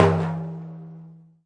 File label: arabic perc, Arabic Percussion Kit